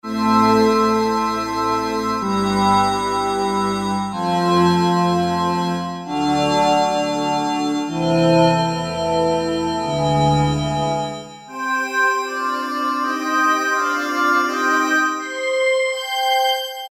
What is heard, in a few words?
Glass Voice, Kawai K1m